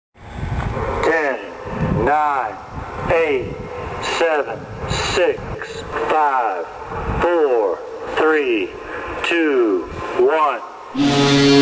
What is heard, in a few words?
racestart, zvuki starta gonki